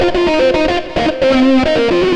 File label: xtra lead